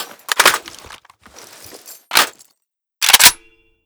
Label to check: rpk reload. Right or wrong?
Right